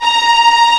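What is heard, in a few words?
Vio tremolo A#2; Digital Sound Factory Virtuoso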